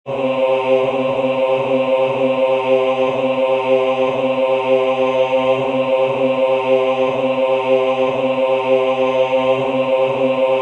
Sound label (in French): Basse; Gounod; Messe solennelle; Et Expecto